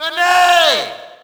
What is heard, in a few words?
Shout, audience; UFC Tapout 2